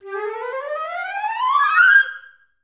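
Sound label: flute run up G3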